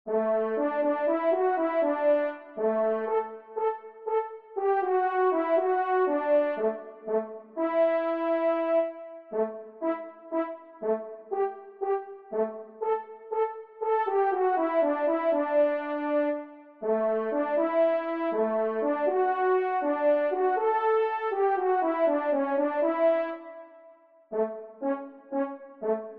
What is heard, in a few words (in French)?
valse, cor; La Valse des chasseurs; Y. Barraquand